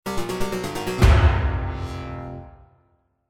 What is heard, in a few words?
Stingers; Xulli